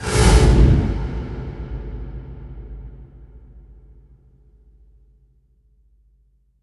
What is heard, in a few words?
impact flame, Cinematic Impact; M-Audio Pro Sessions; Liquid Cinema